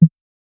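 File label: click button smooth calm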